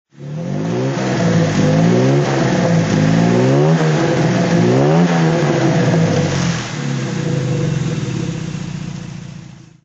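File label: Jaguar mk2 38manual throttle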